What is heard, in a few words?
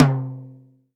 acoustic tom sample c key